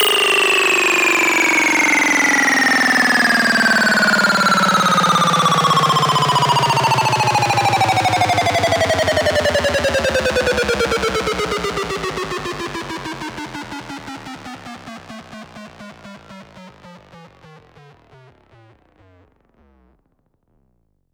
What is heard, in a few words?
Sweeps Down